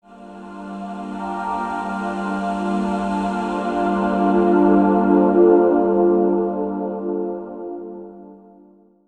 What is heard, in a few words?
Digi Pads; Jungle Frenzy 1